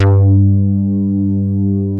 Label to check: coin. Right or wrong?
Wrong